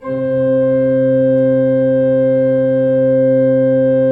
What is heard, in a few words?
C2, Cathedral Organ; Propeller Island; ITAL.PRINZIP